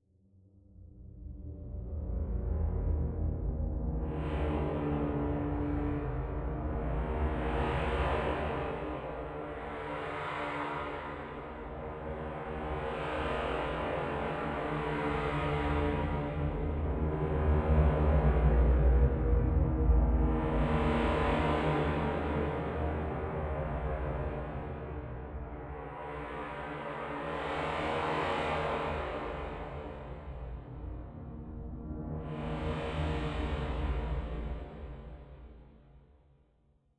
Suspence Pad, Low Intensity

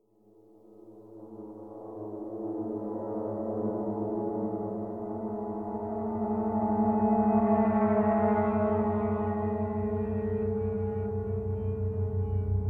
zombie!!; zakuro no aji

